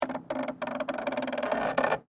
Creak Plastic Stress, Texture, Creak, Plastic, Stress; Creaking Sound Effects; Objects